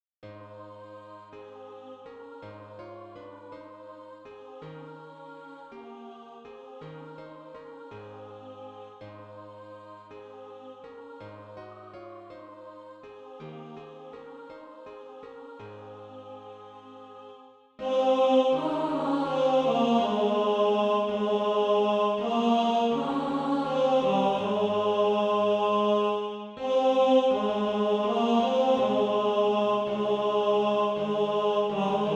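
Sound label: tenor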